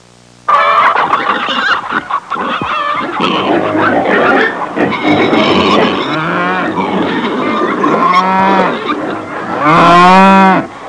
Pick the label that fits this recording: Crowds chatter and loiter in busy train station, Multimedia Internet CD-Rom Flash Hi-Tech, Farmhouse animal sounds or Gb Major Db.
Farmhouse animal sounds